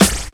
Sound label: HH Snare